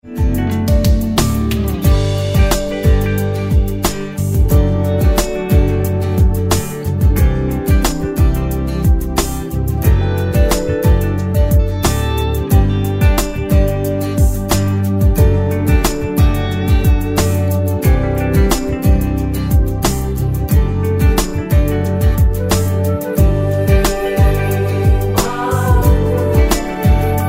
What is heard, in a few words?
Karaoke